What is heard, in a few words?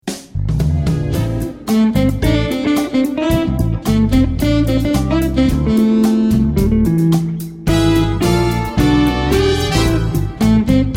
fake brass